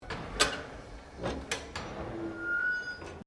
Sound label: Door Boiler Open, Colonial era objects; 1911; Roberts; Miscellaneous Doors; Sound Effects:Doors